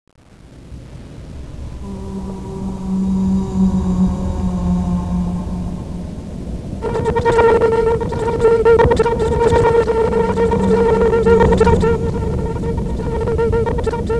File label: shortswim